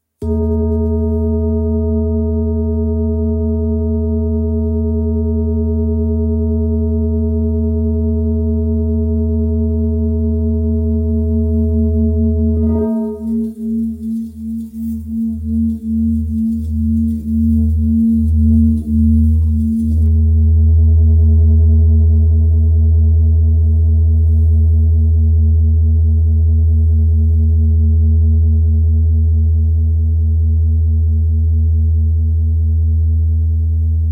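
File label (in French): Bol ramgandi la, bol chantant; Bol chantant Ramgandi à Paroi Fine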